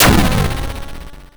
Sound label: shot